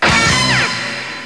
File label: start